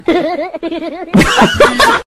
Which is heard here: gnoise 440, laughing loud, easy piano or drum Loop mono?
laughing loud